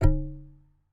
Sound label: Remove, DVD Drive External; DVD Drives